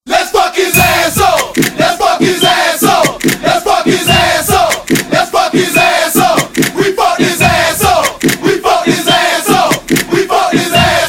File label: Flatline